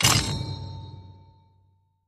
max Bet Button Click; lost relics

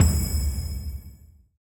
near Win End; fireflies

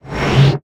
random Wilds Portal Close; finn

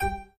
ding; sweetyhoneyfruity

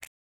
button Up; silverback

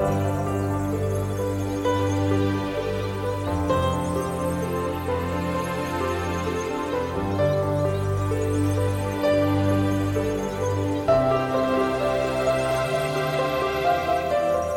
choose Feature Ambience; butterfly staxx 2

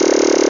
count Sound Middle; jackpot 6000

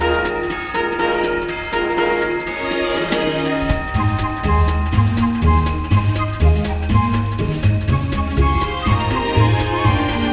ambience Free Spin; secrets of christmas

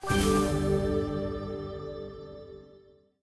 small Win; sweety honey fruity

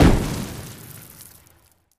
block explode; pyramid

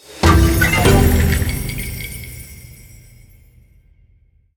winMedium; templeofnudges

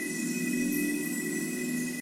win Betline; asgardianstones

